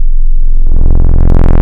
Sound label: Disto, wavetables, Analog; Massive 3-Analog-Electric